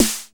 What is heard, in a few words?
909 SD, TR-909; Best Service Dance Mega House Dance